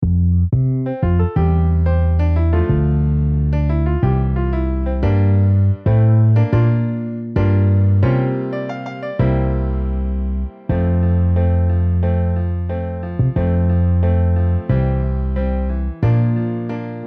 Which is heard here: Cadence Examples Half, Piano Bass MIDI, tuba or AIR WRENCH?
Piano Bass MIDI